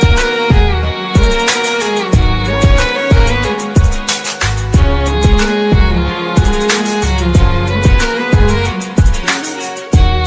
sweet instrumental ringtone, bollywood

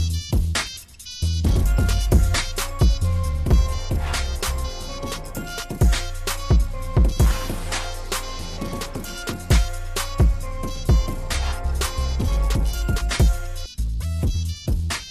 nokia remix music ringtone

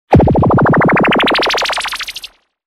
new funny notification ringtone

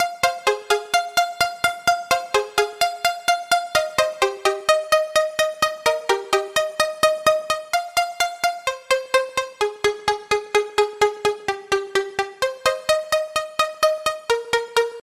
steady tempo ringtone

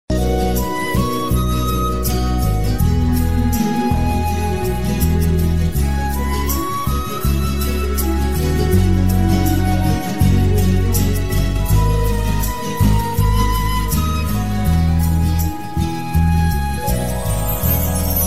flute ringtone

